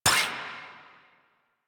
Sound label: Pistol Mech; Weapons; Warframe; Drifter; Operators